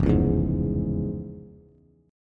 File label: F Minor Major 7th Sharp 5th, Bass; FmM7#5 strummed; 1,4,2,1